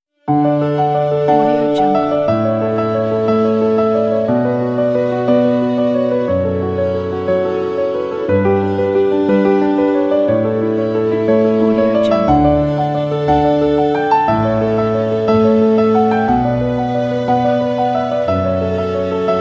wedding day light piano with strings

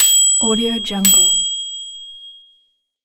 bell

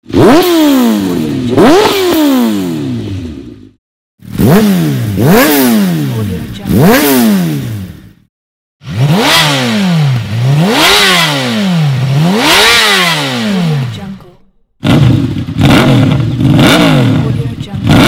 sportbike revving

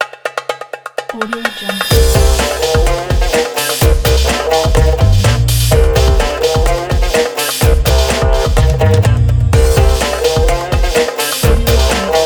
ramadan hiphop intro

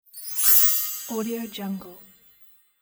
magic wand

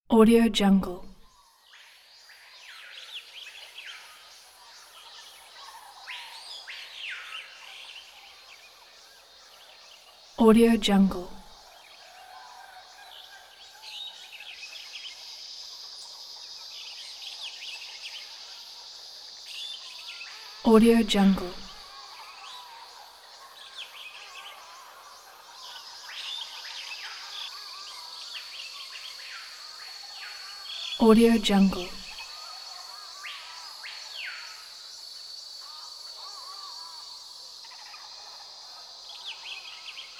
jungle natural ambient sound

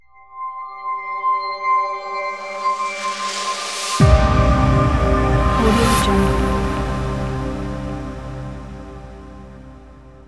dark logo pack; svyats

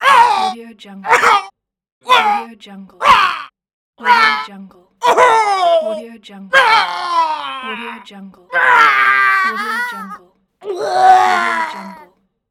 goblin fight and death voices; stormwaveaudio

